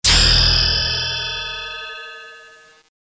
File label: Magic; Total Chaos Nukem 3d Pack; duke